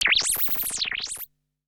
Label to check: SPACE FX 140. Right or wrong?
Right